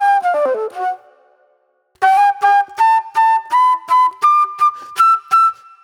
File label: mellow soul flute; Sonic Foundry (Sony Creative Software); Manhattan Flutes; Soul 1